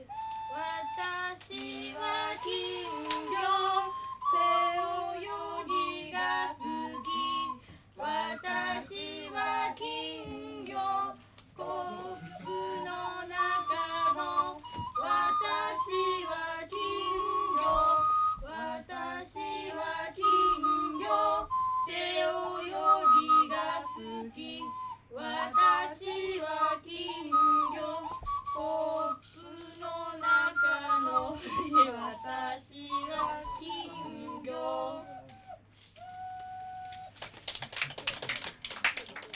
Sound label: QUARTET